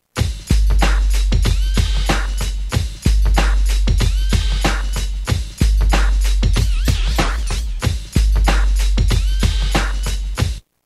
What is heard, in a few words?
Gangsta 94, Roland M-DC1